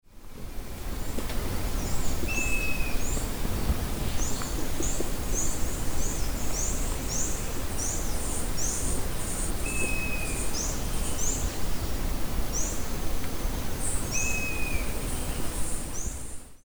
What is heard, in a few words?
Myiarchus tuberculifer Maria cavaleira pequena